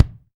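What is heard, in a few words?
BD, bass drum; Best Service Gigapack 2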